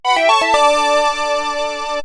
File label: game start